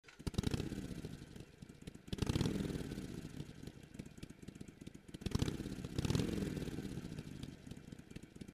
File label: sportster 883 low; hd